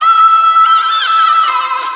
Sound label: Vocal Sample Pack Phrases Am; Slate Digital Omnivox Vocal Sample Pack